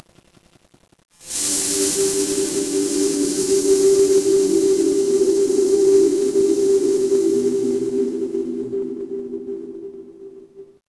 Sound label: horror wind whisper